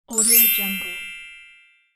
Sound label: magic spark